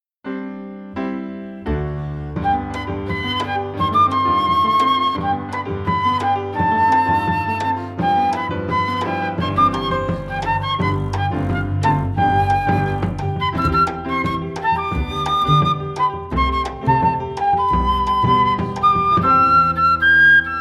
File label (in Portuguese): cinco miniaturas brasileiras toada